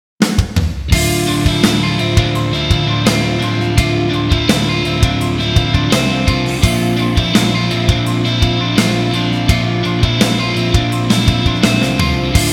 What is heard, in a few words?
Off Vocal Ver